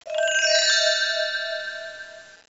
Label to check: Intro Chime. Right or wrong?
Right